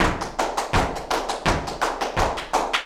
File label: flamenco tapping, jaleo; Best Service ProSamples vol.46 - Flamenco